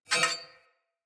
Short Sword Whoosh Accent; Weapons; Accents

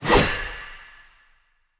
Dagger Throw Perfect; Weapons; Player; Swords; Fey

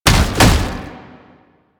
Gallop, Foley; Enemies; Warframe; Proto Infested; Babau

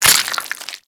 Proc Bleeding; Warframe; Mods

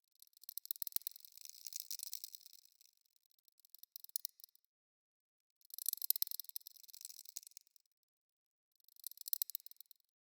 Corrupted Critter Loop, Ambience

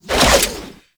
Crew Ship Pilot Finisher Leap; Railjack; Grineer; Cinematics

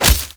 Unarmed Tempest Punch Impact Default

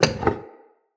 Vase Medium Impact; Vases

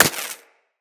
Laser Impact Generic

